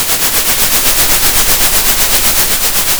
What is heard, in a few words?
pop, Sound of a train